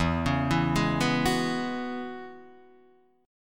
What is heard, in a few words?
E 6th Add 9th E down Guitar Open D